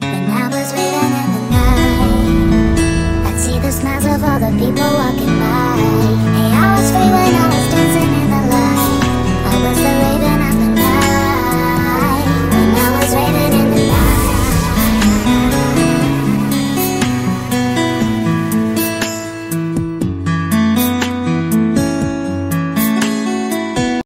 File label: Xiaomi Poco M4 5G Ringtone